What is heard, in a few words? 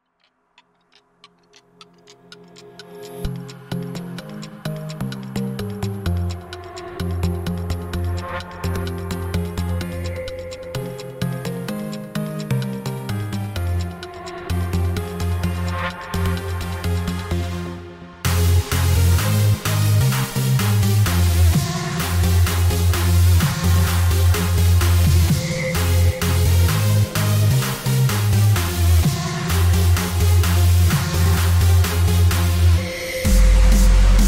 Bass Boosted, edm